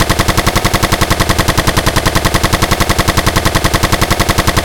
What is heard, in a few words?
TATATA